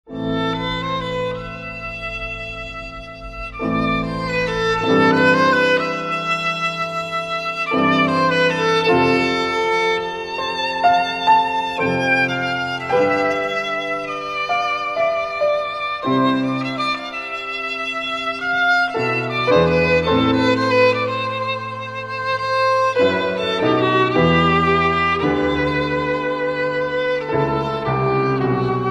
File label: violin piano masterpieces